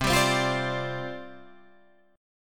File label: C Suspended 2nd Suspended 4th, down, Guitar, Open G